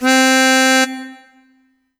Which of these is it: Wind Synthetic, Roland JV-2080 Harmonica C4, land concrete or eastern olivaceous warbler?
Roland JV-2080 Harmonica C4